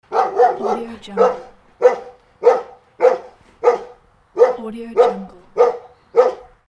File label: dog barks